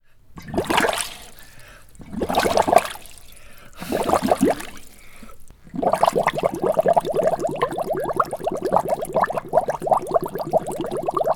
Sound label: Flotante Bubbles